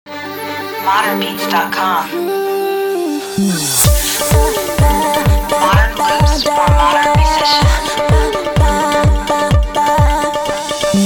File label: Pop RnB Guitar Loops